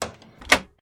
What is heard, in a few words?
door close